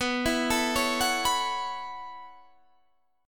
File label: B 9th Suspended 4th B down